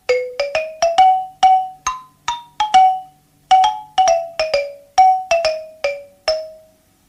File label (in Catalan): xilòfoon; descobreix l instrument